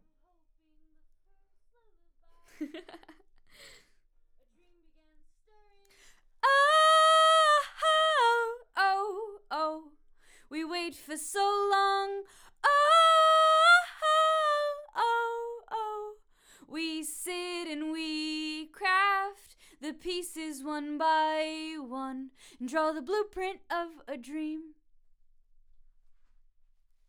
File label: Vox start new